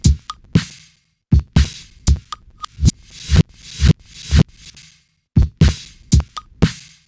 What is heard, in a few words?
nts-1 rev